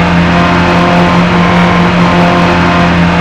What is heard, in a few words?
prius mid, vehicles; tdmcars